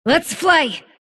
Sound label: sylph move pain; dota; dark willow